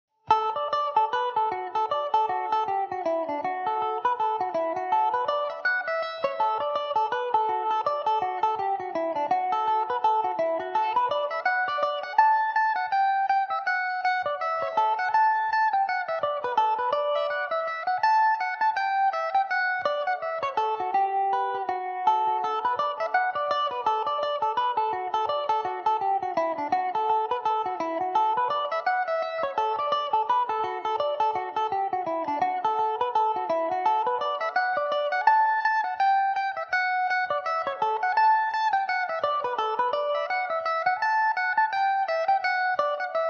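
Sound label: D major, mandolin; Mandobird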